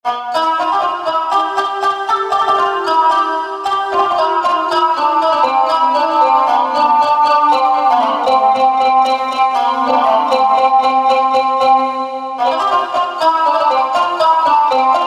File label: Kanoun, Arabic Sounds; Generalmusic Equinox